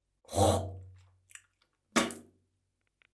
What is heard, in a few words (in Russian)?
plevok